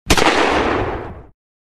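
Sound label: 10 Gauge Shotgun; blobwarsAttrition; amigaos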